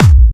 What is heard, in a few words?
Clubby Kicks, Bassdrums